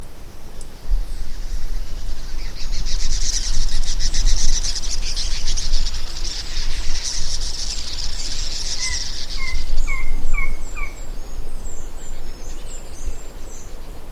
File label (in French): martinet a menton blanc, chant